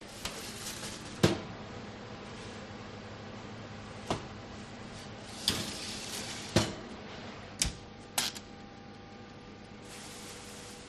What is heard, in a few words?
bakery food preparation place in oven